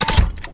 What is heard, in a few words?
system recharged cue